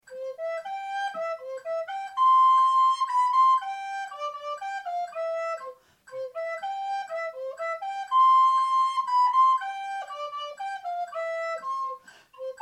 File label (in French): flute